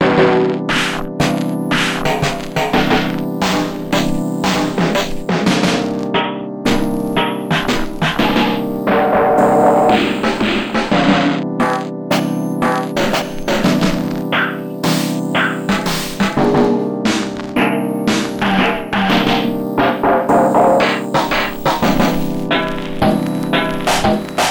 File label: e vinyl snare